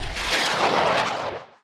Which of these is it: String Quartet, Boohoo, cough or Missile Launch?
Missile Launch